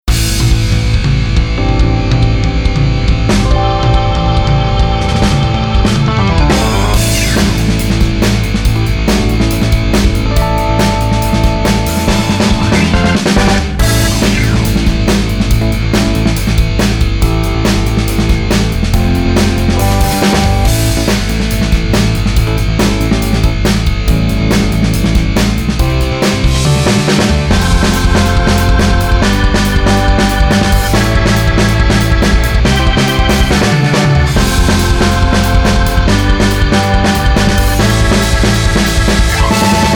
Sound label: Rock; ABILITY